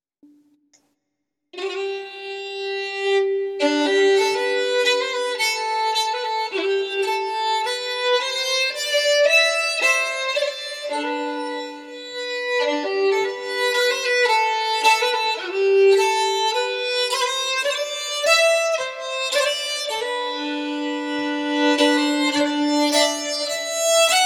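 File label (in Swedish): Paradismarschen, säckpipa; efter Ernst Pettersson Brattfors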